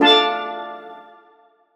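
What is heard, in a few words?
steelpan chord D minor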